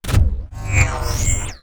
wooshes transform sweetener

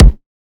Riddim Kick

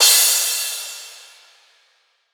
Crash; Hats and Cymbals

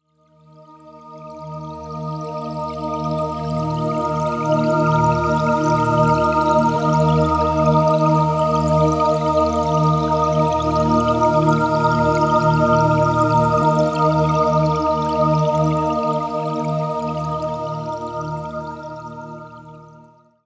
Pad D#, Color Bass; One-Shots; Pads; Ghosthack; Color Bass Essentials

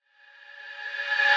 Reverse Impact; House; FX; Feral Reverse Impact; Reverse Impacts

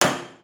fx pop; Cartoon - film effects

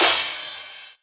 Crash, Dubstep Cymbals; One-Shots; Drums; Petrichor; Ghosthack; Ghosthack - AC2024 - Day 13 - Dubstep Sounds 2025

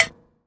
Percussion, House Drum One-Shots

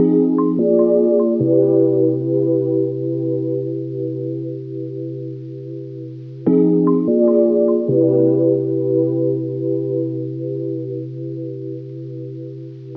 74 BPM C Maj, Hip Hop Melodies Lofi; Lotus

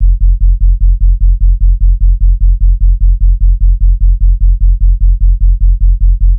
Sub Loop F; Ghosthack

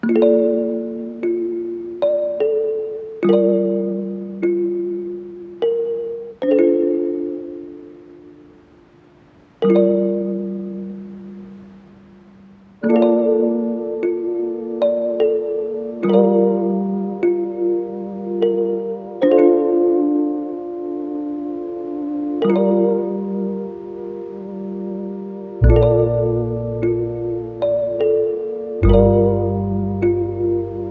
75 BPM D Min, Melodies Lofi; Cymatics; ZODIAC Beta Pack

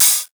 Open Hat; Heater; Cymatics; Future Bass Starter Pack; Drums - One Shots; Cymbals

